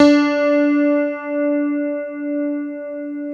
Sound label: CP-80, Yamaha CP-80; Greytsounds VOL-1